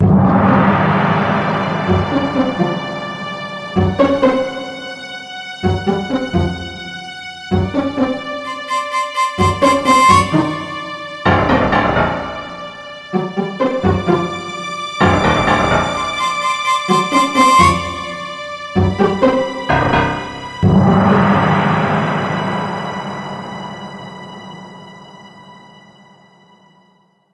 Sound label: Music Game Haunting